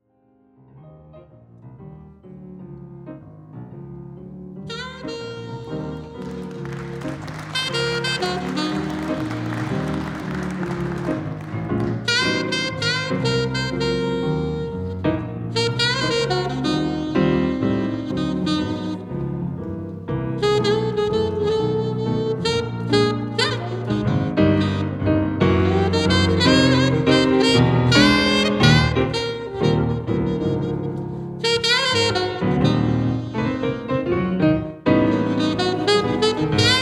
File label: JAZZ